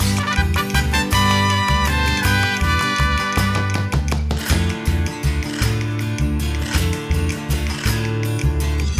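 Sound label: music food inferno suspense no vocals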